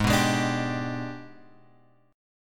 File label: G sharp Minor Major 7th Flat 5th, chord; G#mM7b5 Chord {4 2 X 1 3 3} strummed